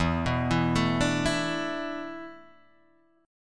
A Suspended 2nd Suspended 4th E

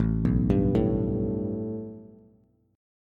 A 7th Suspended 2nd Bass; A7sus2 arpeggiated

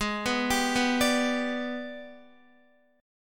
Ab Minor Major 7th Flat 5th, down, Guitar; AbmM7b5; arpeggiated

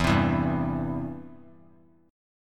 E Minor Sharp 5th, chord; Em#5 strummed; JGuitar

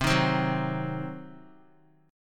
C Diminished, Guitar, chord; strummed; JGuitar

